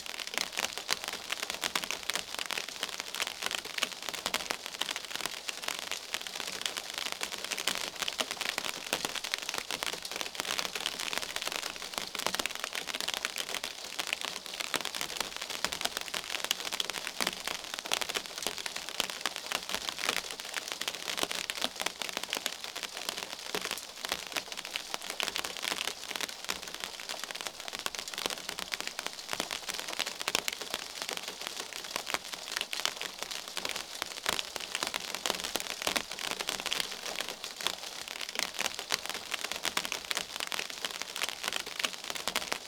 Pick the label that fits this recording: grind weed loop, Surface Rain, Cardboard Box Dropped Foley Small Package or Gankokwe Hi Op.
Surface Rain